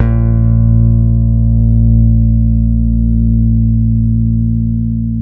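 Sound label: 8 finger bass B 2; Spectrasonics; Bass Legends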